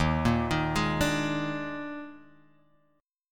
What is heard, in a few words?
Eb Major 7th Suspended 4th; arpeggiated; x,x,1,3,3,3,4,x; EbM7sus4